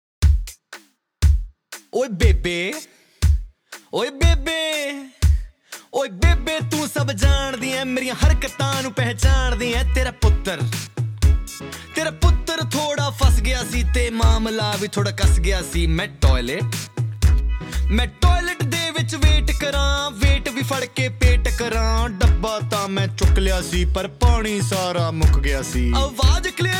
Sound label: Rap